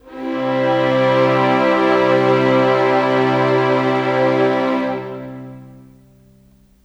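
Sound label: Pad C major, Orchestral; Sonic Foundry (Sony Creative Software); Orchestral Series VOL-4 - Rock and Pop; Pads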